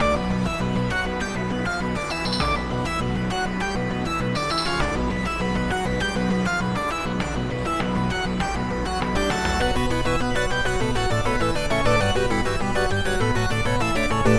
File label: Small Boss battle tune